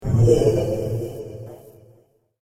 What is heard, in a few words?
Alien Wind Trap; Alien Sound Effects; science fiction sound effect; Andrew Fleming